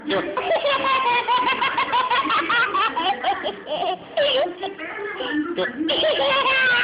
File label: Little Kid Laughin